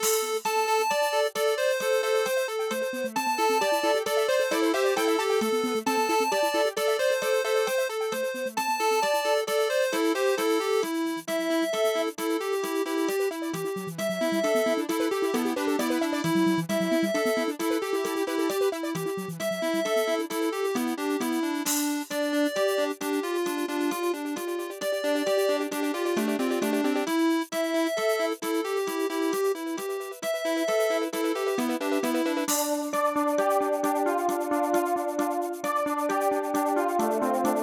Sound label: Yamaha DD-55; System Shock - Medical